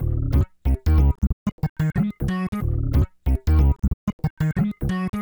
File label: Funk Riff; Sonic Foundry (Sony Creative Software); Synchro Funk